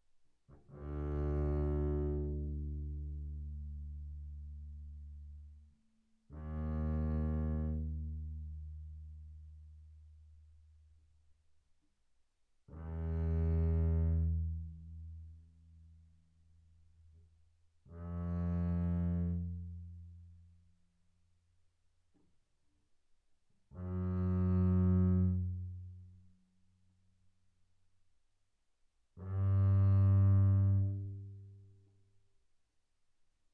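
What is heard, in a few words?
Bass arco sul D mezzo forte D2 B2, double bass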